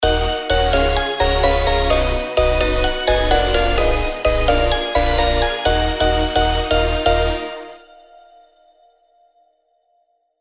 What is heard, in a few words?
Chords D (128 BPM); MIDI ANTHEMS